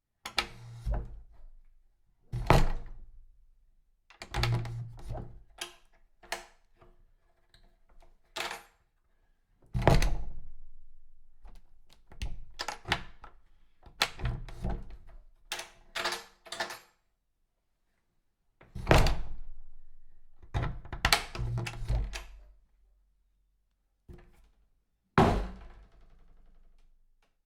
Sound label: wood door close and rattle